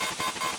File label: Gun Overheat Warning